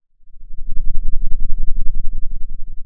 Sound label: pop, Sound of a thunderstorm